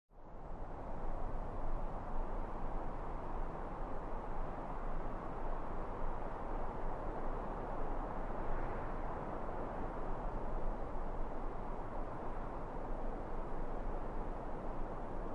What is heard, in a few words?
Calm Pine Forest Win